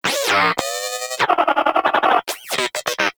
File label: Moa Inquisitive Vocal Cold Shiver; Pets; Warframe